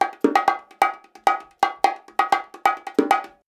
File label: 130 BONGO, Percussion Loops; USB Soundscan vol.36; AKAI